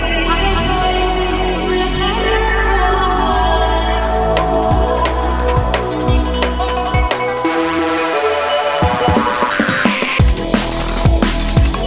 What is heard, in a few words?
Dnb